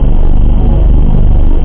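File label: power generator; lander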